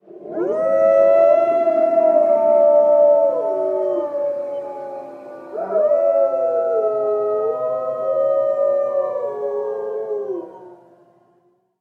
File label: Wolf Pack, alarms; Seasonal Celebrations